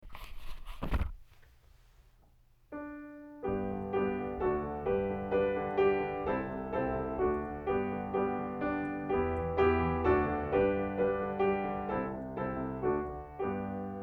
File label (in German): Instrumentalbegleitung